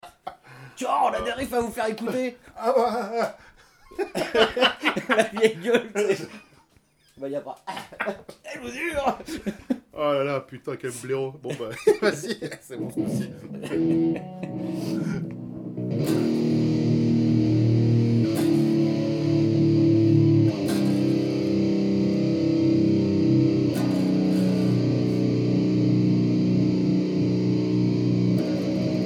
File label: riff death